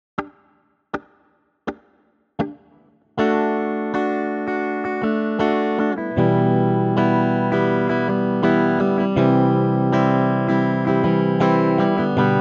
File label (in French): Rythmique; Les doubles croches; Les rythmiques II